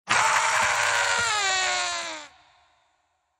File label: Demon Scream